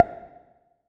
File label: smart sticky mode on, ChromeVox Earcons, Reverb